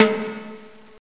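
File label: tumble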